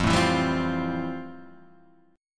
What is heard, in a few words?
F Major 9th F; FM9 strummed; JGuitar